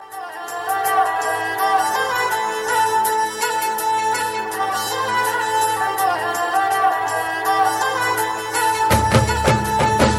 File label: Beautiful Tone